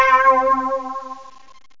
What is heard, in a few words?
synth; Zoom - Release 2 (1996); Active Software; synths